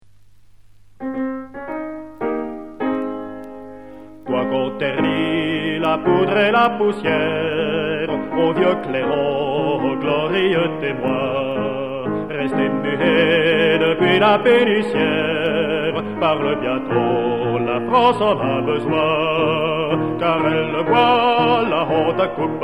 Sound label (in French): Clairon